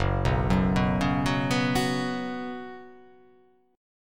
F sharp Suspended 4th

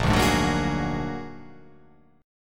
A sharp Augmented 7th A sharp, guitar

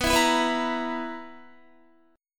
B Major 7th Suspended 4th, Guitar; BM7sus4; strummed; 4F,4A,5C,5G,5C,6E; 18,19,16,16,16,18

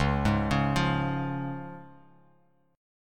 C sharp Major, Guitar Open F; 1,3,1,0,x,x; arpeggiated; JGuitar

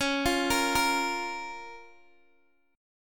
C sharp 6th C sharp down Guitar Open G

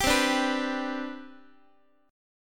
C Major 7th Suspended 2nd C down Guitar, chord; CM7sus2 strummed; JGuitar

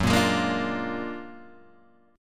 F sharp Augmented Major 9th F sharp Guitar Open G

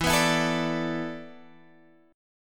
A Suspended 2nd Suspended 4th E

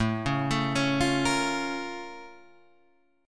A sharp Minor Major 7th

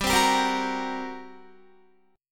G Minor Major 7th Double Flat 5th, G, Guitar; GmM7bb5; strummed

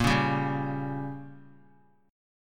Bb Minor 6th, guitar; strummed; JGuitar

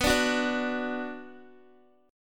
B Minor B Guitar; strummed

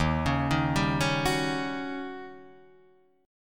D sharp 7th Sharp 9th, Guitar, Open D

